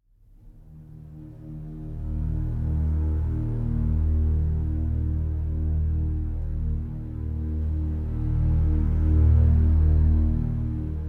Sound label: original score